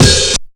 FILL DRUM; USB Soundscan vol.27; FILLACOUS

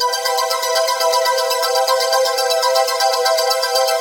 (arp) single chord; Sonic Foundry (Sony Creative Software); Ugly Remnants VOL-2

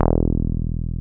303 Basic E2; Drums In Your FACE!

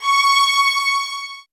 C, soft violins; Q Up Arts; Denny Jaeger Master Violin Library

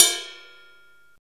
Ride set, Drum and Bass

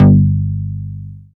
JUP BASS; East West - Phat & Phunky

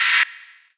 Hats, Elektron MachineDrum SPS1 MKII

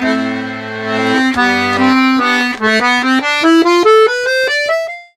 Accordions; USB Soundscan vol.40; AKAI

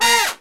POP BRASS

